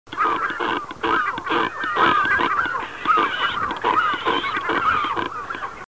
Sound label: Phalacrocorax carbo